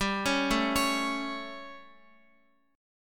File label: G Suspended 2nd Flat 5th G, Guitar, 4F, 4A, 5C, 5G, 5C, 6E; Gsus2b5; arpeggiated; x,x,7,6,9,9